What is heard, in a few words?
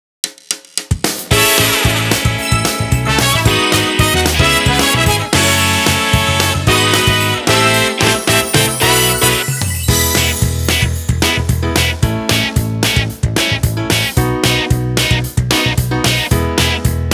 (off vocal)